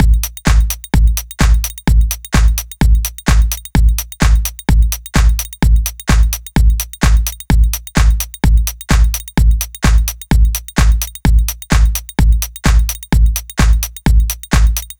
Techno Kit Beat; Mutekki Media; Vengeance Electroshock Vol.2

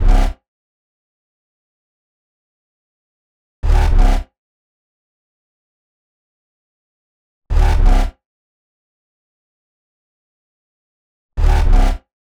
124 G Bass, Complex Electro Synths; Prime Loops; Elements

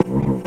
C STAB; funky; Loopmasters; Funky Underground Vol 2

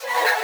F# VOX STAB; funky; Loopmasters; Funky Underground Vol 2

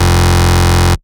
Bass Shot F

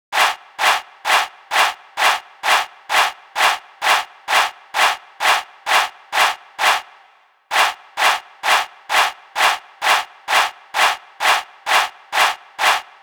130 D#m Crowd, Complex Electro Synths; Prime Loops; Bias

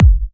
Bassdrum

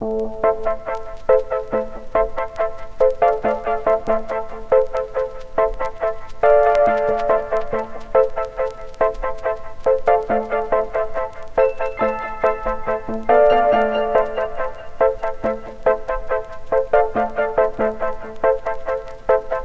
Bpm140 Music Loop Am; Airy; Spring; Prime Loops; Dubstep Illusions

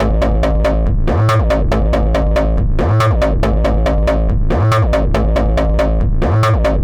140 A BASSLINE, Dubstep

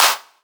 Claps & Snares

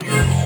F STAB, funky; Loopmasters; Funky Underground Vol 2

